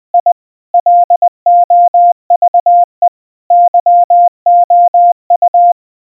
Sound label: I LOVE YOU, Морзе; Самая популярная фраза Морзе; Генератор азбуки Морзе